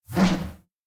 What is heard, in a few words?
swing blunt 2 hand